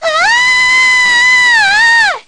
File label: scream; peach